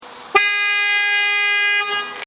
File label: horn after